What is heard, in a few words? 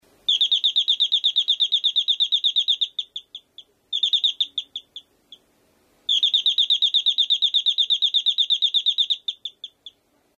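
doorbell sound canary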